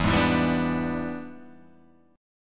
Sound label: E 7th Sharp 9th Guitar Open G, chord